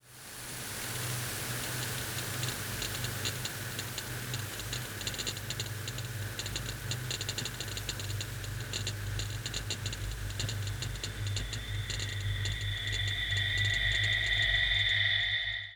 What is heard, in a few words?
time clicks fire